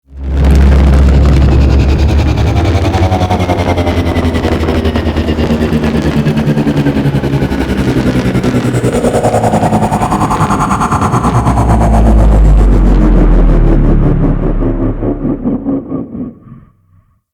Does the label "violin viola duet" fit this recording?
No